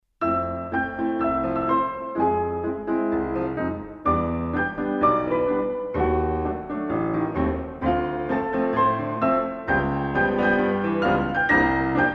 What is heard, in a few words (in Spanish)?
Improvisacion Slow Fox para 4 manos